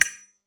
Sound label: bet button